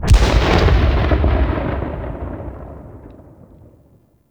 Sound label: Weapon Enemy Grenade Tail Outdoor; Warframe; Weapons